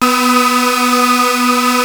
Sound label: Prophet 5, B4; Hollywood Edge - Giorgio Moroder Rare Synthesizer Collection